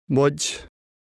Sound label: Key Sounds